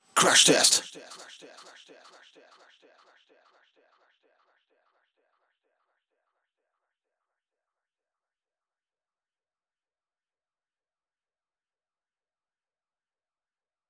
Acapella

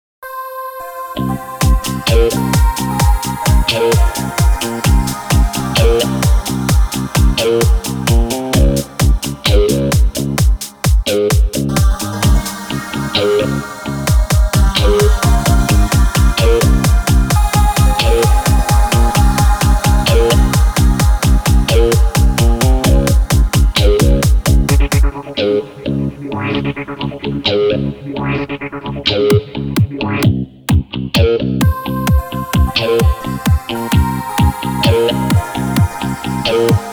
gitar bass; Den4ik

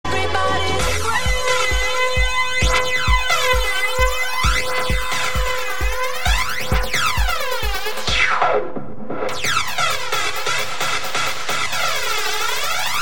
Rington

